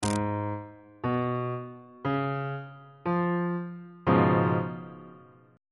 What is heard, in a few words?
c sharp dominant 7th 2nd inversion; bass clef